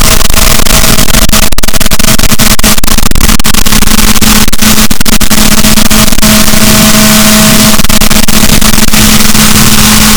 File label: rorquals, Balaenoptera Physalus, boat